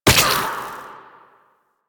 Venom Burst; Warframe; Saryn

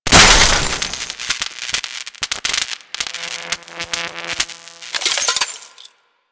Container Circuits Open; Objects; Material Specific Loot Containers; DE; Warframe; Storage Container

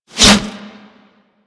Bullet Default Hit; Warframe

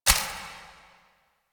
Countdown Tick; UI; Undercroft; Warframe; Duviri

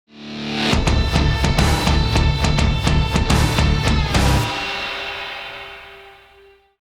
Bounty Success Cue, Music; Dynamic Music; 1999

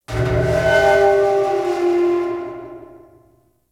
Settlement Metal Screech; Ambience; Warframe; Grineer Settlement

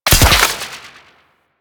Grapple Fire; Enemies; Warframe; Kela De Thaym

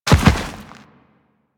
Land Dirt, Footsteps; Warframe; Teshin

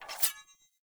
Sword Accent Blade

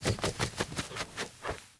Foley Idle Ear Scratch Fast; Enemies; Kubrow; Warframe

